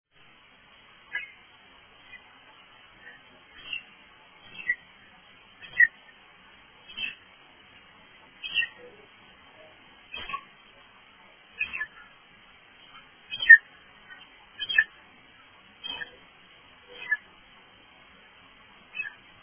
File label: manatee